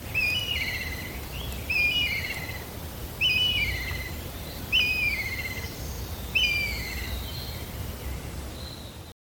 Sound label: black kite call